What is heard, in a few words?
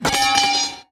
Enchant Fail